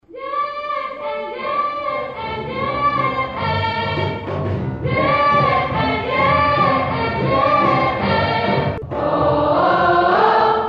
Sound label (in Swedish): bas, stammor